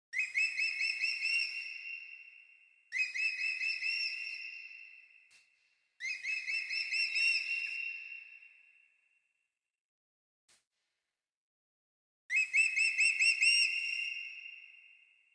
crested Hawk

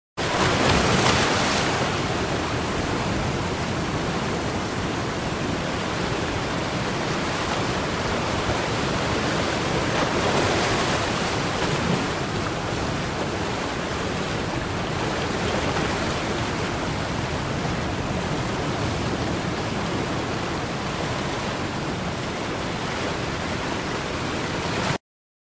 Shore waves

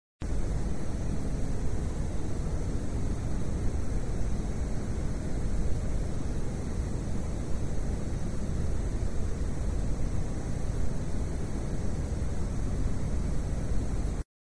Soft Wind

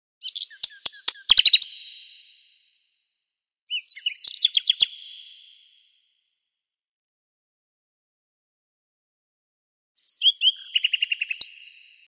Nightengale Song

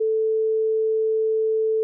440 sinus

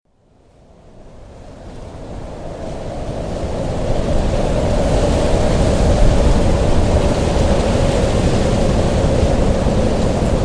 Wind Howling Nighttime

